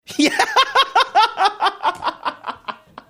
boisterous laughter

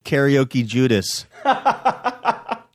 karaoke

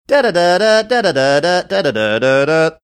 singing nokia ringtone

